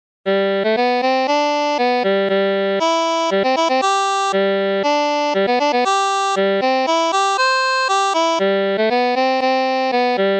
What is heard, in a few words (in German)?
Polka 2 Harmonikas 1. Stimme